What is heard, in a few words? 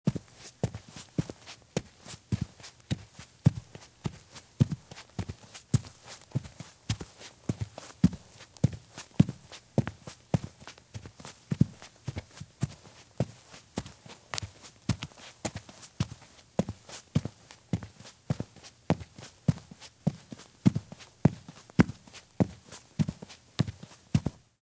Women's Heavy High Heel Shoes Walk at Fast Pace on Grass; Sound Ideas; Footstep Sound Effects